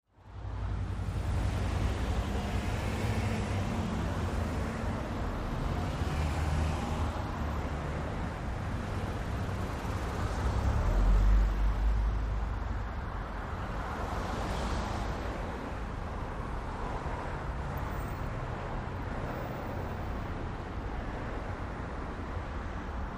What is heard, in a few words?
city traffic med, car & truck bys